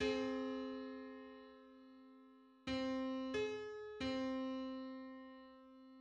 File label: Two-hundred-seventh harmonic on C; MIDI